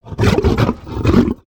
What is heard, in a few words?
Pets Lion Happy; creatures; Lego